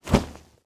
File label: Portrait Movement